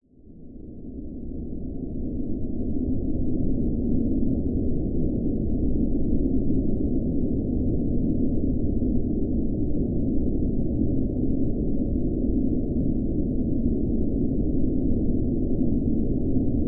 nature wind cold blizzard constant droning designed